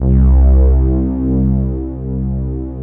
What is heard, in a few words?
LUSH BED